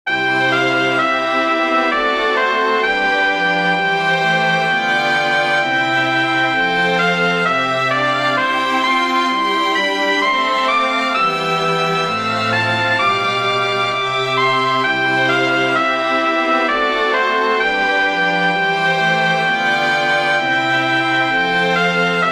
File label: chorale for piccolo trumpet strings; bach johann sebastian; fahr ich hin jesu christ